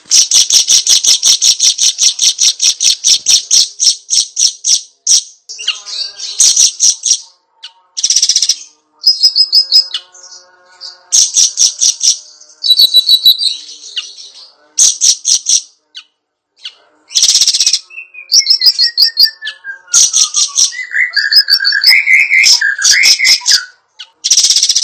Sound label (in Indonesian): Suara Siri Siri Besar Tembakan Tajam Untuk Masteran